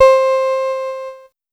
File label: Casio VL1 Piano; Giga Samples Collection